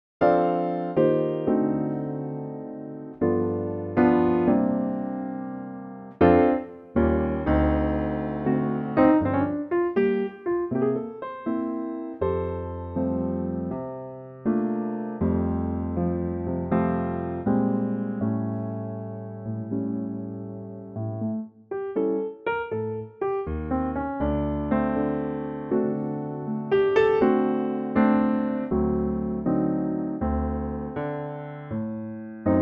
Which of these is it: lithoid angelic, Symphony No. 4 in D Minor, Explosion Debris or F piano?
F piano